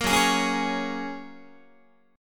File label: F sharp Major Add 9th, Guitar; F#add9 strummed